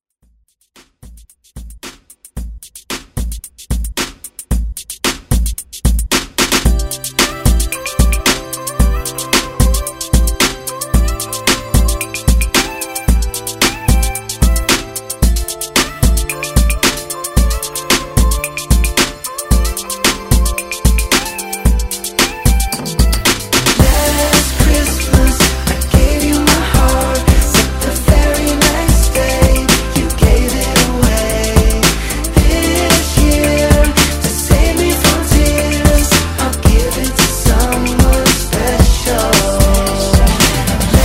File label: Xmas